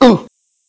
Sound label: grunt male